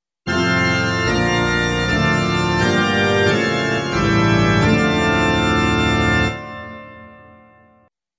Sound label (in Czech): Doprovod; První liturgie CČSH